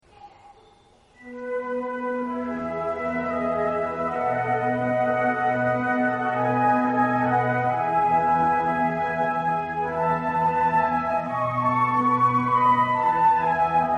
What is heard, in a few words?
concert, flute; 別れの曲; arietta-flute